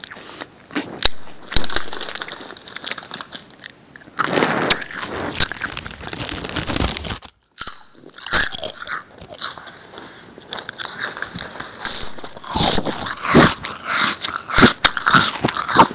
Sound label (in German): Geraeusch Chips